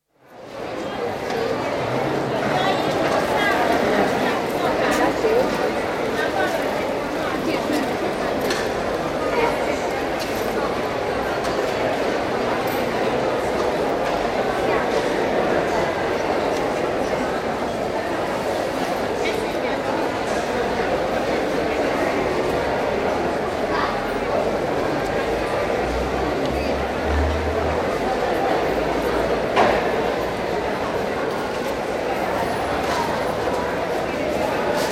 Fruit and vegetable market (covered), Pecs, large and echoey, with speech, footsteps, bangs, distant traffic; Outdoor; BBC